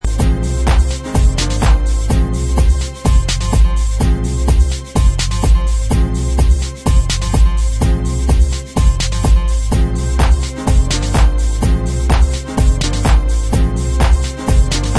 acid techno

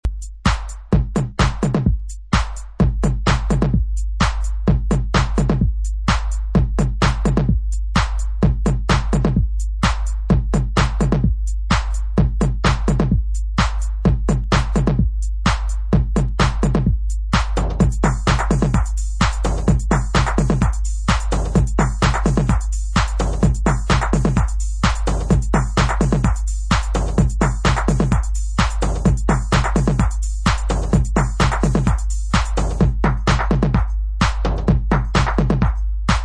Instrumental; Nice & funny raw Chi-house.

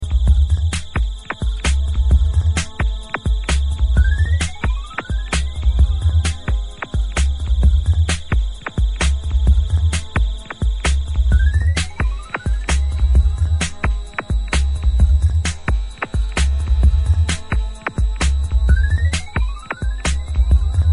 zombie bass

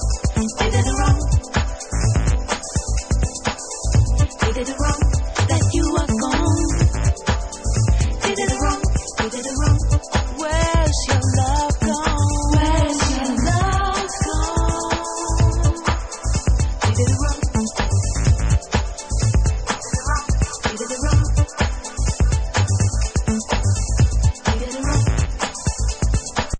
vocal

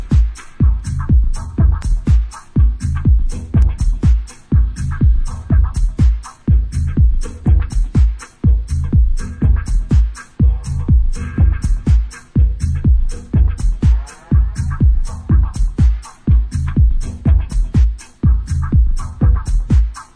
Instrumental; house

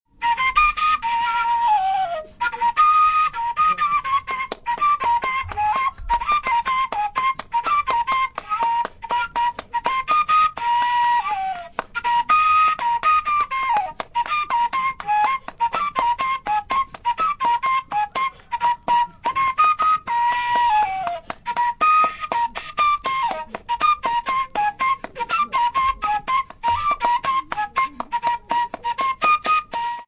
Flute Instrumental

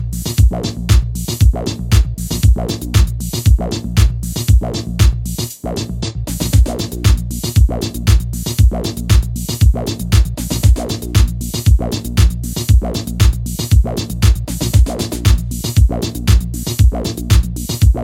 Space Gong

